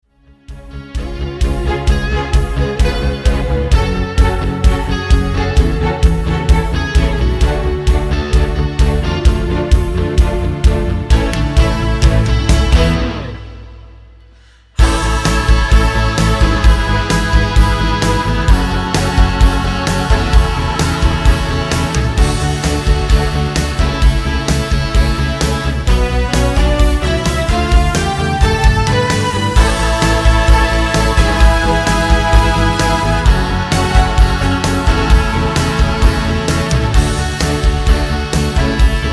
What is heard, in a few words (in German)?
ohne Piano